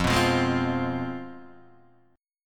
C Major 7th Suspended 4th Sharp 5th, guitar

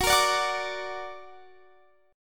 F sharp Augmented F sharp

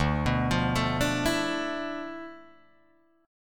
D Minor 6th Add 9th D, Guitar, Drop D

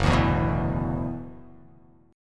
F sharp 7th Suspended 4th Sharp 5th F sharp down

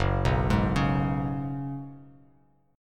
Gb Suspended 2nd Suspended 4th, Bass Standard; Gbsus2sus4 arpeggiated; 2,2,6,6

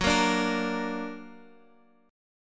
F sharp Augmented F sharp Guitar Half Step Down

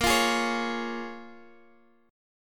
A sharp Minor 7th, Guitar, Standard; strummed; capo 3; x,x,8,10,9,9

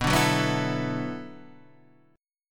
B Minor 11th B down

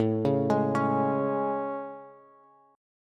A Major 7th A, Bass; AM7; arpeggiated; JGuitar

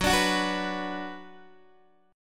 Gb Suspended 4th Sharp 5th, Guitar, Open D; x,9,12,12,9,9; down; chord shape

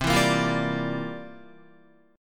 C 11th C down Guitar; C11 strummed

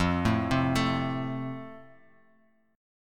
F Major, Bass Standard; arpeggiated

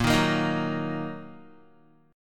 Bb Suspended 4th Sharp 5th Bb down Guitar; Bbsus4#5 strummed

